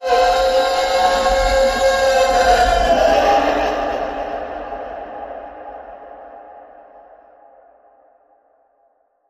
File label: Mermaid Call Bend